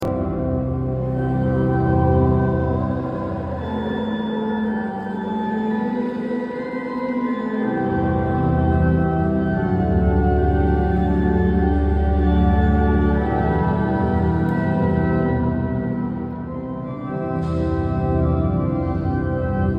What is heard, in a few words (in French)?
ORGUE son; CELLES SUR BELLE 79